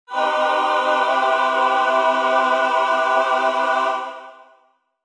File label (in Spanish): sonido angelical